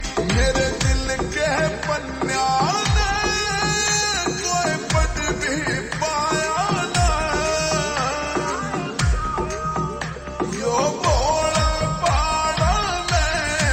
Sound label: Slow Reverb Version Ringtone